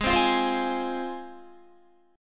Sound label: G sharp 6th, Guitar; G#6; strummed; 16,15,15,17,17,15; 4E,4A,5D,5G,5C,6F